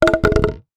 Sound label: foley bottle plastic thick empty drop on hard surface; zapsplat